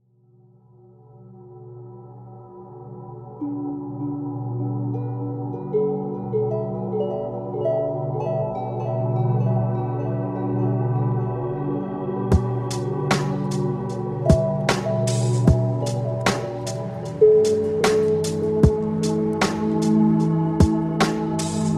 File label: oriental soundscapes